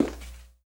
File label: walk tile